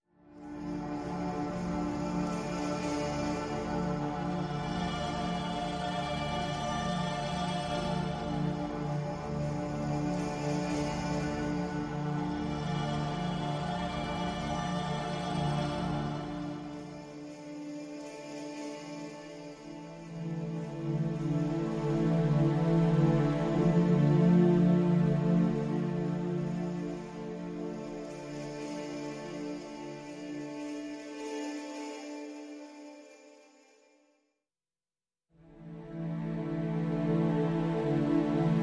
Pads Only

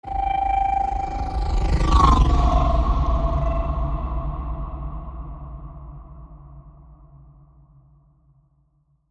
Drone Flyby; Raiders

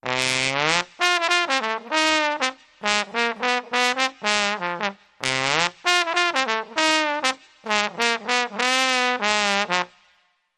Trombone Lively Bridge

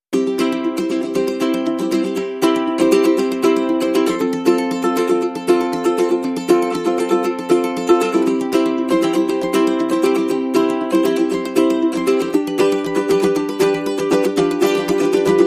Ukulele and Guitar Mix

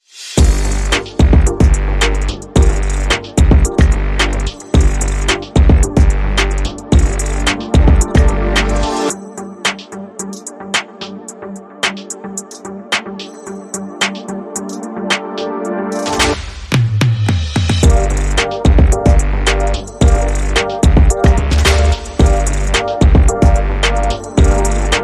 Dramatic Strides, Underscore

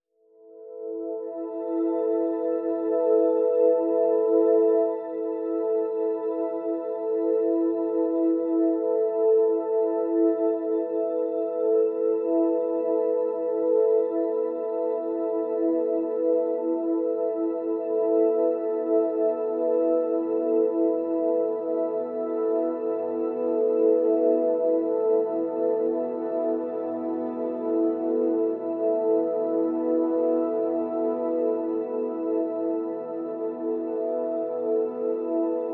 Pads Lifted (A)

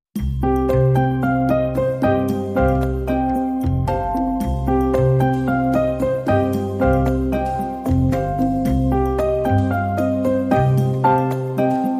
Urban Life

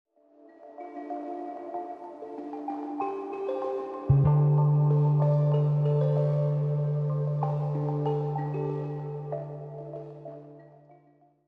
Sting

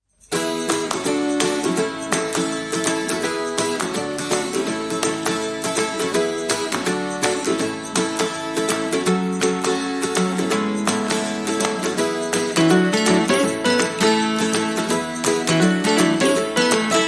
No Drums No Bass

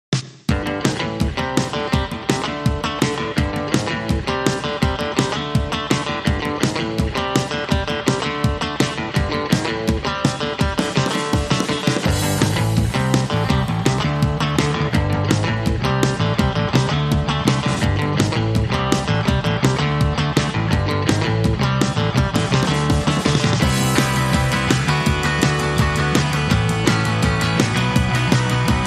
No Extra Guitar

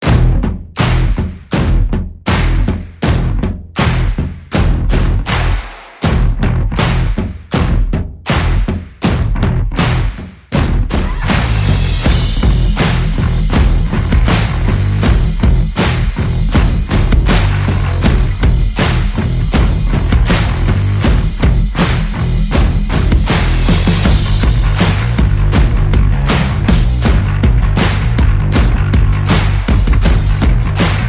Percussion Bass and Effects

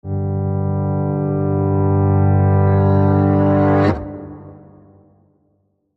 Doomsday Sweep

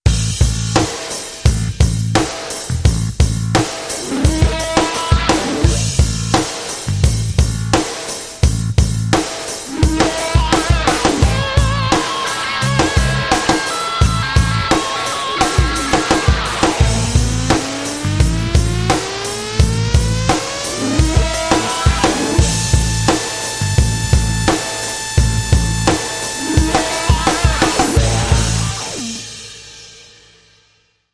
Rusty Guitar, Underscore

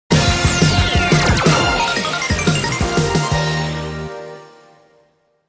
Fizz Stinger

